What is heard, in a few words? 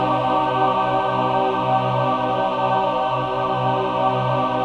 LC MIN C4, Symphony of Voices, MIN.CHORD